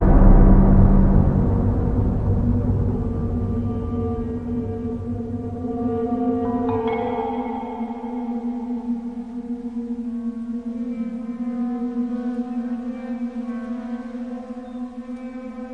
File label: atmospheric cinema; Atmospherics